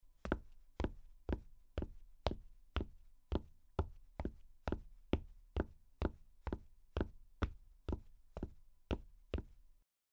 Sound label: footsteps stone